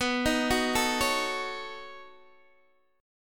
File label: B 9th B down Guitar DADGAD